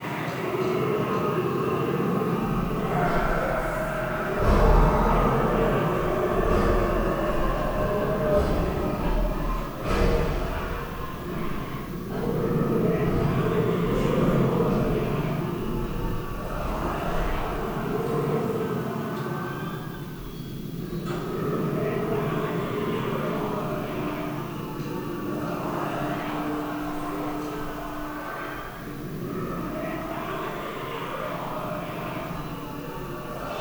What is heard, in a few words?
Orcish Mineshaft (ambience); fate 3 the traitor soul